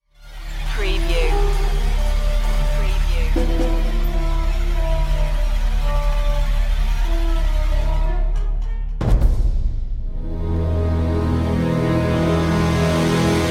no drums no wind, orchestral soundtrack suspense tension